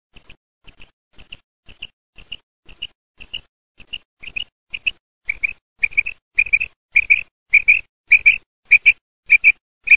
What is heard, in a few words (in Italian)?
quaglia